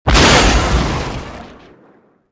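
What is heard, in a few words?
sentry explosion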